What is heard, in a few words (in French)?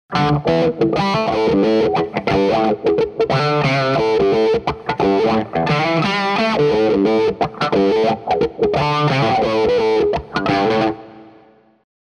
guitare electrique